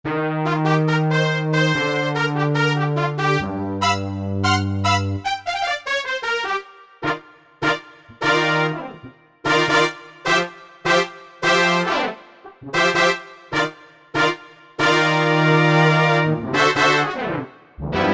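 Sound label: Trumpet-Brass, Korg MSC-9S Organ; Trpt-Brass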